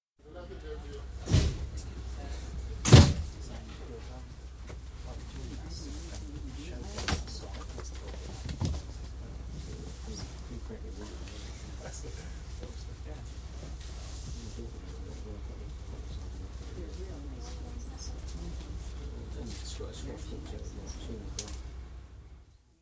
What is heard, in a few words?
Diesel Commuter Railcar, interior, train boarded, with doors & voices; BBC